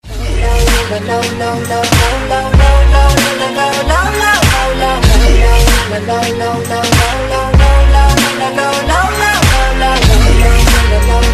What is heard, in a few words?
low sub